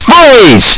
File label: fake freeze; svencoop; echoes